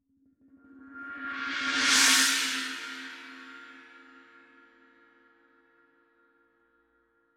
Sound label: rude 16 wild china roll, paiste